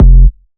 Bass F, Bass Synth

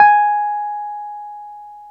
PNO, Roland Keys of the 60s and 70s, Wurly

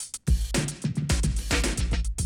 Classic DrumLoops, 110

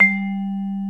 MARIMBA VIBES

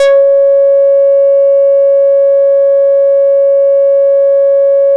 C#6, Bass; E-MU Producer Series Vol. 6 – Analogia Project; Boom da Bass

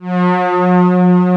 VCO SUS PAD

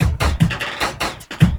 LOOP, Drum & Bass, 150 BPM

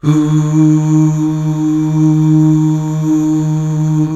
UUH

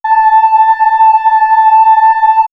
Swirls Voice, pads; Obie Voice; X-Static Goldmine

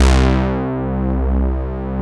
SYN, analog; Sound & Vision - Gigapack I CD 2 (Roland)

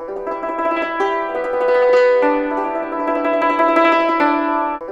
YNCHIN 100 G; INIS - Opium

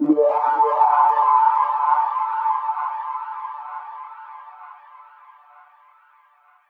Rhythmic; Sonic Foundry (Sony Creative Software); Underground UK House & Electro

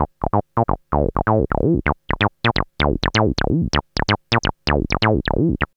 BASS, TECNO; AMG - Vince Clarke Lucky Bastard

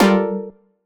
stab bust F, Noise; stabs

